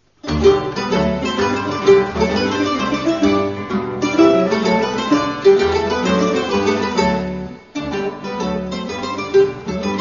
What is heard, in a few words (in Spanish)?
Musica Acuatica Country Dance